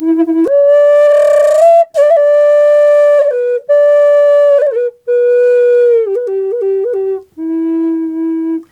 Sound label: E wild flute; Big Fish Audio - Didgeridoo and Other Primitive Instruments